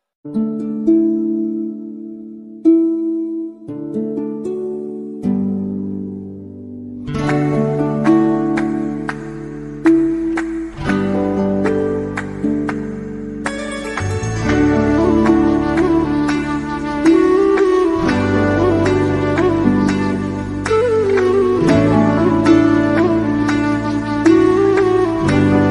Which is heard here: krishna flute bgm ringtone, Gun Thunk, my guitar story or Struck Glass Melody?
krishna flute bgm ringtone